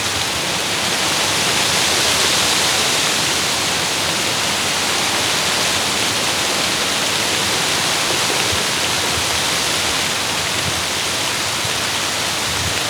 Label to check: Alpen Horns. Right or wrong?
Wrong